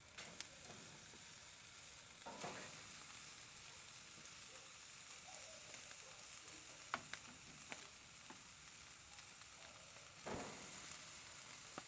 Grill

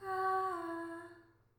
Ghostly Singing

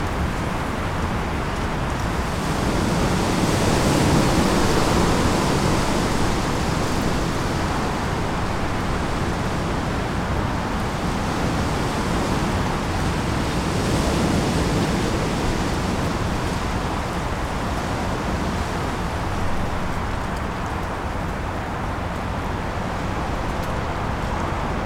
Storm Wind Trees Suburb

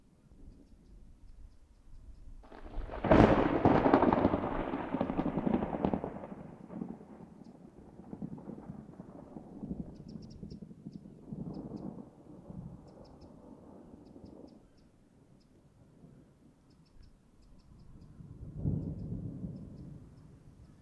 Thunderclap

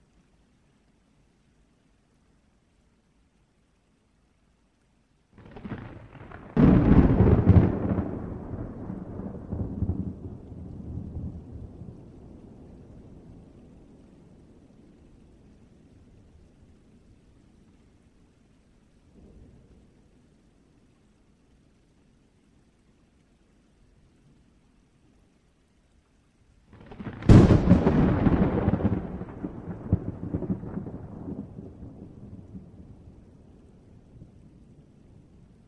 Thunder Claps Constant Strikes Storm Cracking

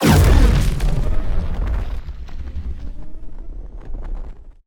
Fire Impacts Complex

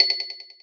Ice spell

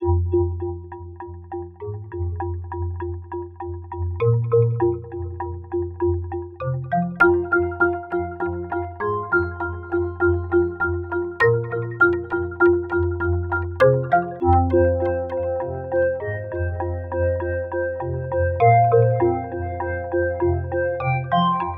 Dreamy Background

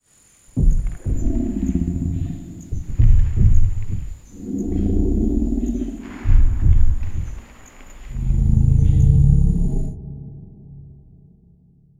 Lumbering Tree Monster

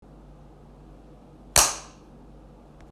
Smacking Sound

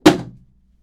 Chair Smack

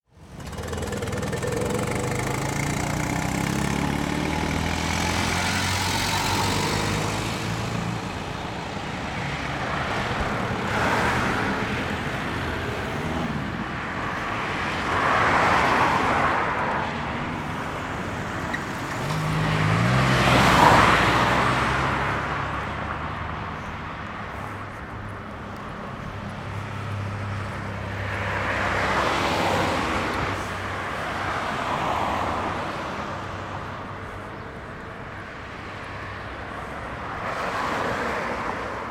Cars Around Me Crossroads

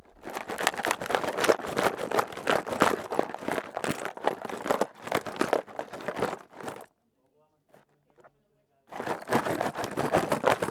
Puzzle Box

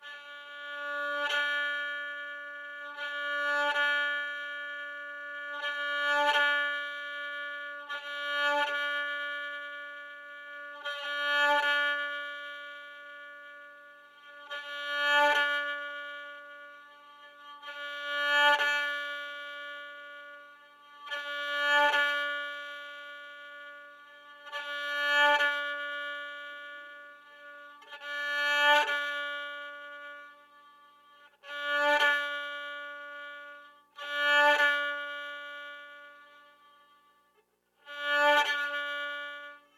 Long swell loop

